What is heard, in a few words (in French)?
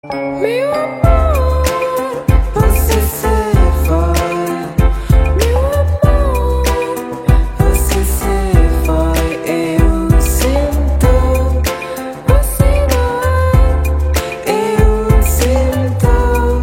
plein de bisous